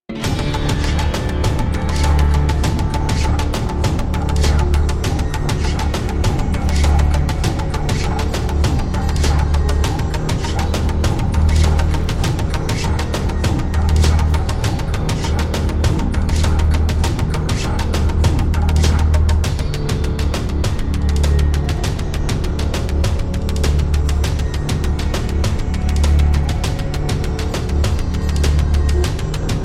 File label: Tribal Battle Theme